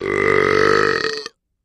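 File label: Medium Strained Belch; belching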